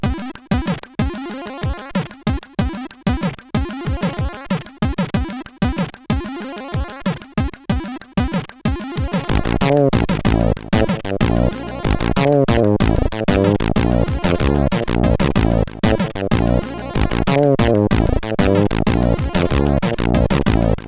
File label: Slow Funk, CSG8580R5